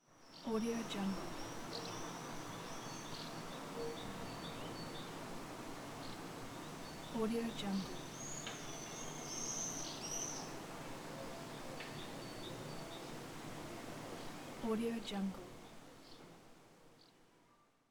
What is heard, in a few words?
calm residential suburban daytime ambience; urban sounds